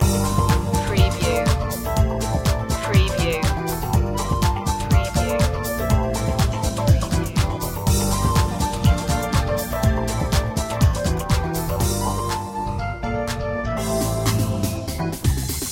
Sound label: snapshot style loop